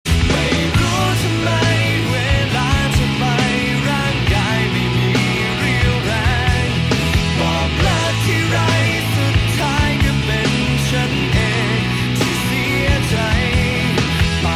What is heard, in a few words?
Thai Rock